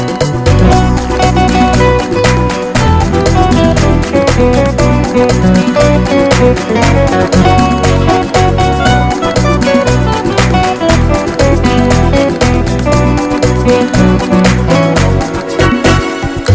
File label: Hot Paso Dance